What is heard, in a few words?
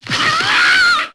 Yell